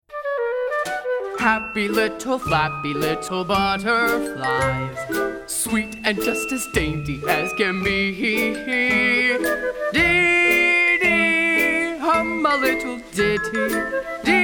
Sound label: Guide Vocals; Audition Cut